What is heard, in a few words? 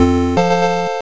Jump Clear; Off the Wall; pc engine